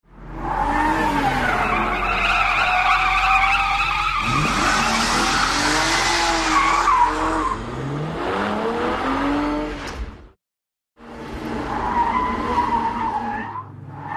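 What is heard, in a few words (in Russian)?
дрифт на автомобиле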